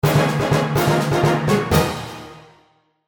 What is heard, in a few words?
fanfare; Chrono